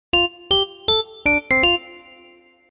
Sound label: custom notification; Explay; Atom